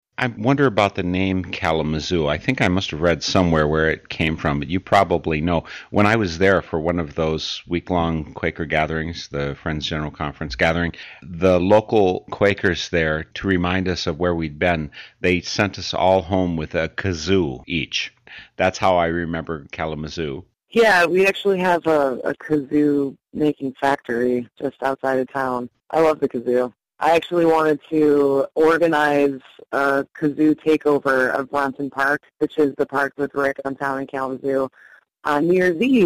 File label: kazoo